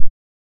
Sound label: a deep muffled ui click; menu